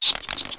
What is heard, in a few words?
Zombie